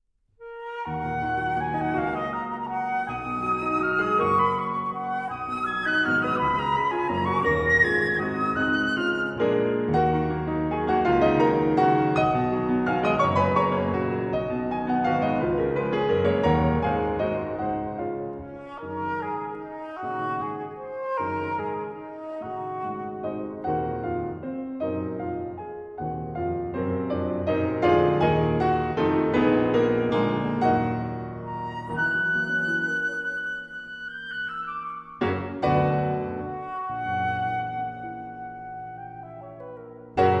Sonata in E-Flat Major For Clarinet and Piano, Allegro Appassionato